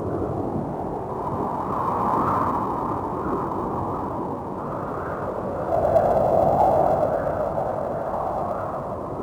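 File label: village wind, colombia; ambience; Soldier Of Fortune II Double Helix; XBOX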